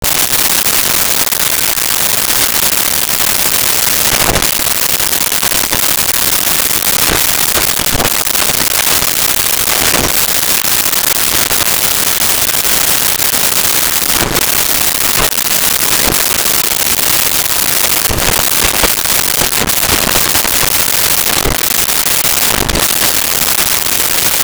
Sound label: brushing teeth; Bathroom